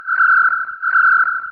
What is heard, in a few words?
warning